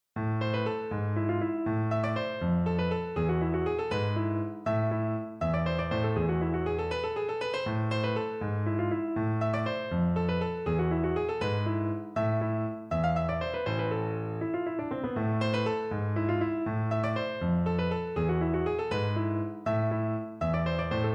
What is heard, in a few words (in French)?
Sonate en La mineur Gaillard clic, tuba